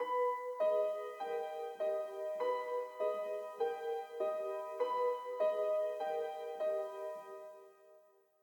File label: Suicied Music